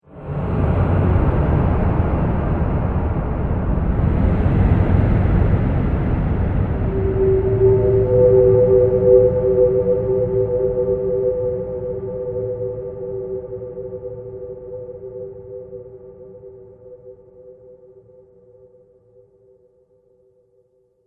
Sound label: Horror Scene Resonant Fear, Scary Soundscape